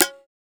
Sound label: Percs